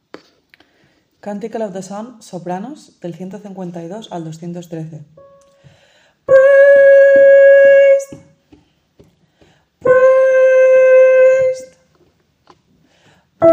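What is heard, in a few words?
soprano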